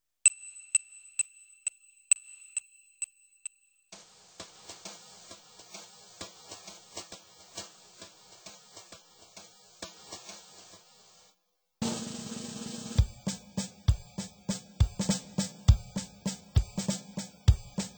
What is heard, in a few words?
drums, Yamaha PSR-530